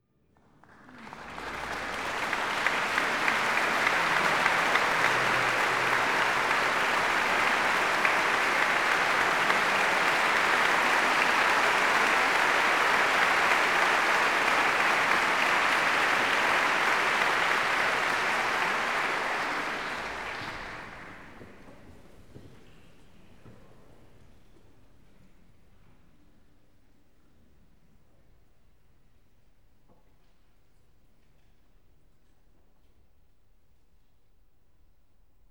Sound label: Applause; Start Brahms Symphony No2; Sergiu Celibidache